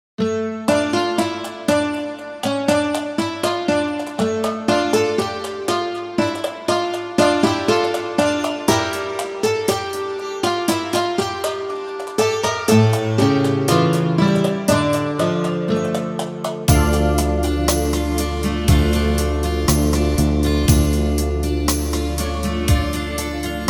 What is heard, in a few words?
brass minus